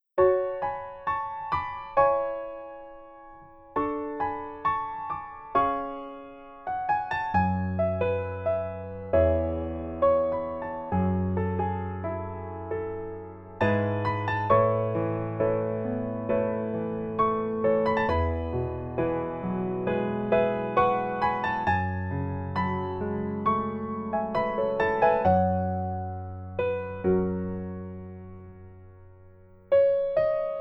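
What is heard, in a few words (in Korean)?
Piano version 멜로디MR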